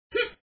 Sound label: Wall Jump; Tails; Tails Nightmare 2